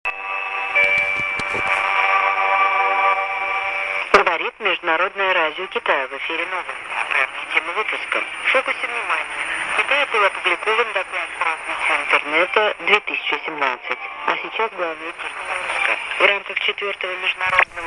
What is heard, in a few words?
Chaina radio on DSB TRX